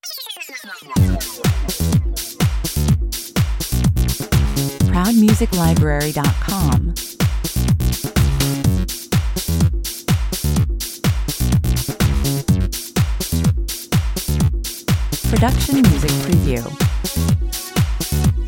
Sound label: Fresh, funky, strutting and very confident, mid-tempo electro-tech track, 125 bpm